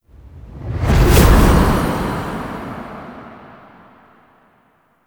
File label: Whoosh Out; Warframe; Tau; Cinematics; BornAnew